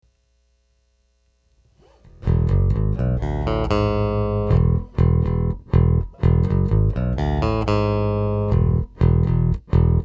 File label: six tones